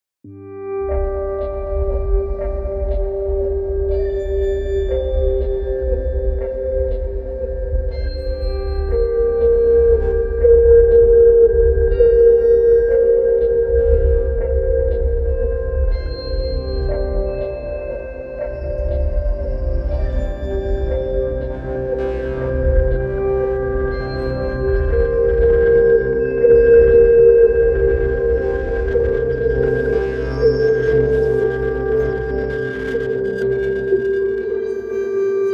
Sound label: guitar cloud